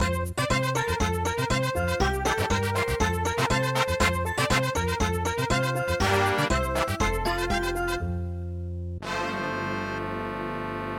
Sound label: mambo; london